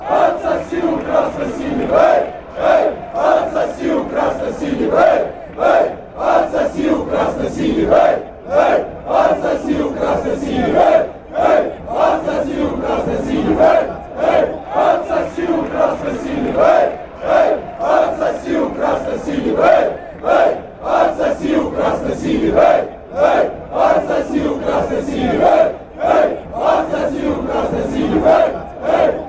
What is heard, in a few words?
suck red and blues, fanchants